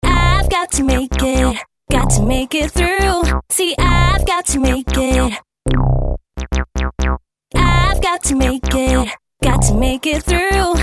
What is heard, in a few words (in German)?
beispiel 128bpm original